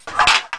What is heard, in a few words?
reload small; emplacement